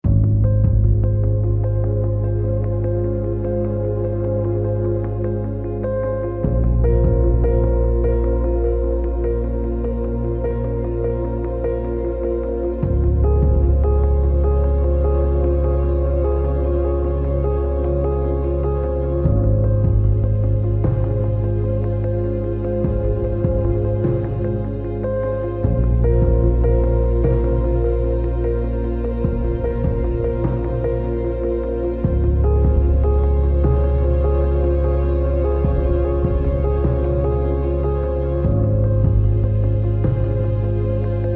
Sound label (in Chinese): 剧情叙事, 宝藏背景音乐